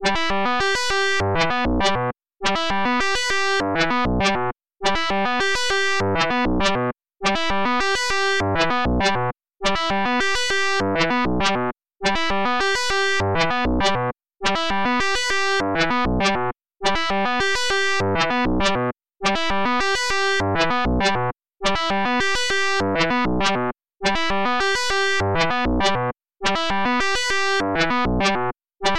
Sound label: ammo synth